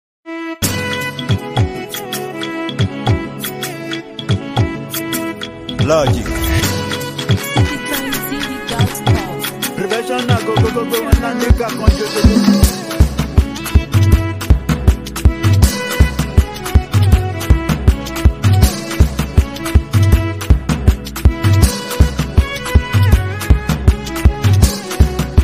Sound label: Beat